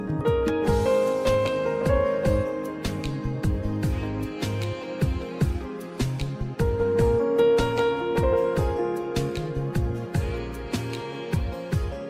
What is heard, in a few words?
instrumental ringtone